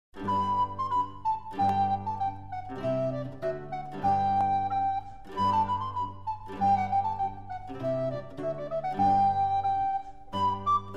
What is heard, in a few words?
Jig; Kemps Jig